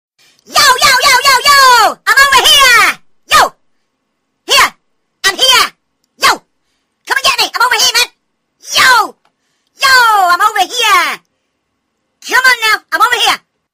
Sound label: lost tune